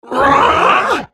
spellcast effort

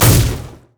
zone knockup; Zyra; LoL

